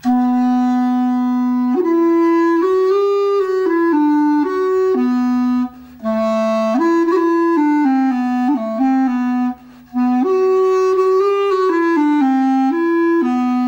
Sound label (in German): bambusklarinette